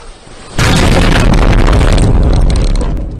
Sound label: guy dunking basketball on ice skates